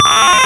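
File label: human death; robotron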